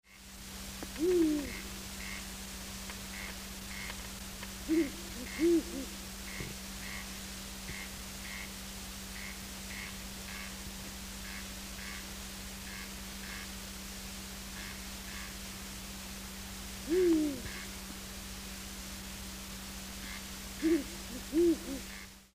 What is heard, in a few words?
strix uralensis